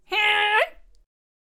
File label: Dragon Carrier Attack Vocals